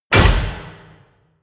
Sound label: slam, tueren